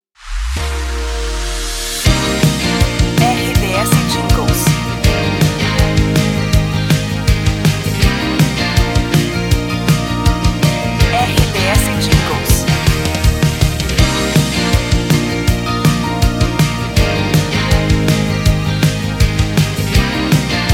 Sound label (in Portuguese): Trilha para locução; Radio Legendaria 2023 Theme 05